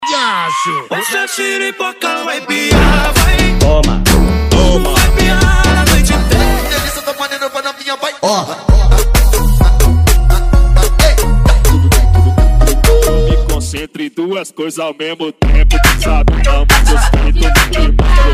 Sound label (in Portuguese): eletrofunk